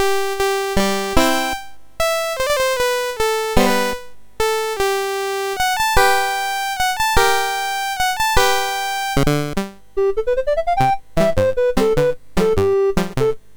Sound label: Whistler, MOS 6581; MOS6581R3